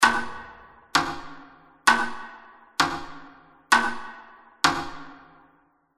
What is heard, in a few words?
Pendulum clock